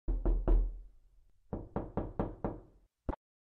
knock knock 3d sound; real troll twitch streamers ear prank

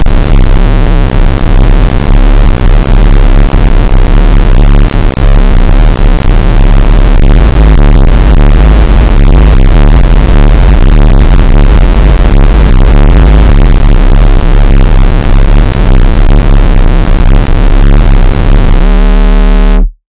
pure bass boost

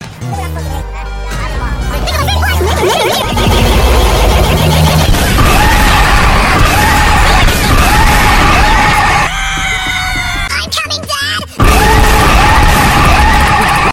rahhhhhhhhhhhhhhhhhhh

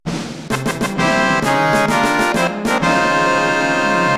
fanfare; ffvii

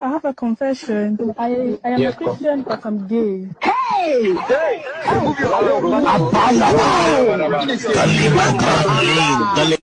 nigerian church

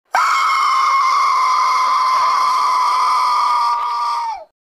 screaming

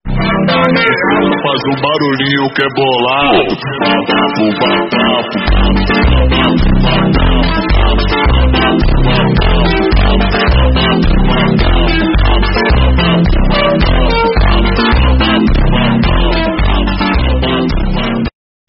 phonk; squid game

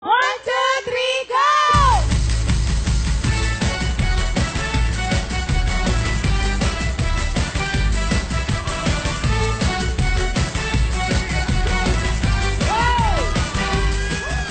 1 2 3 go music

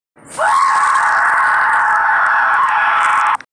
scream

